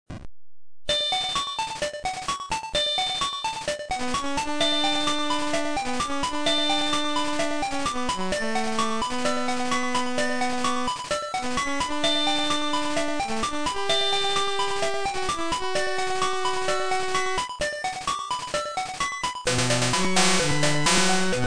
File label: instrumental; Link's Awakening